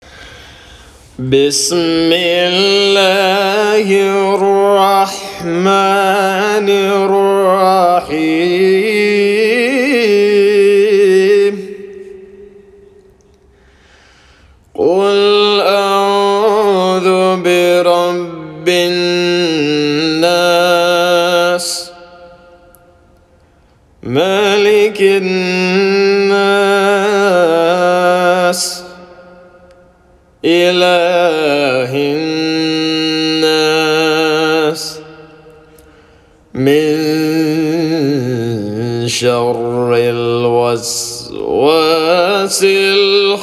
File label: Chapter 114, Al-Nas (Mujawwad) - Recitation of the Holy Qur'an